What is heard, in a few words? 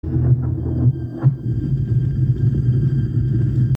Alien amb; Resurgence